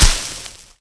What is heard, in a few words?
Plant Breaks; Angry Birds Rio